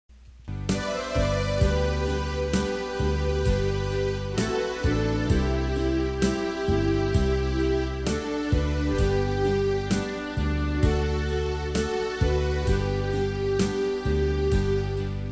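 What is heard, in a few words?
soprano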